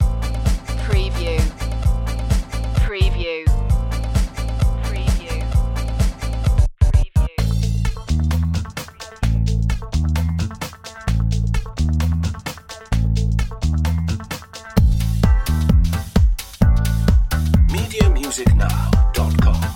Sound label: french brass